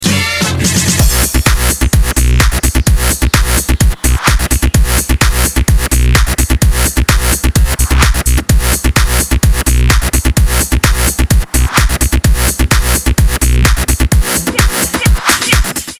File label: ringer